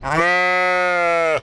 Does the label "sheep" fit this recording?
Yes